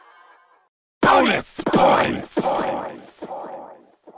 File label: bonus points; zombies